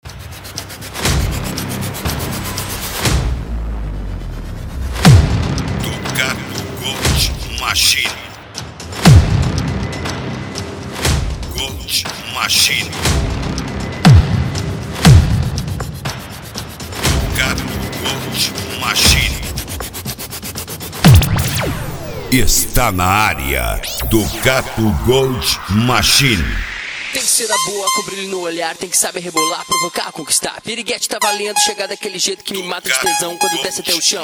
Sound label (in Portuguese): eletrofunk